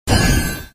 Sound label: beam; war3source; moonqueen